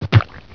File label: hook fire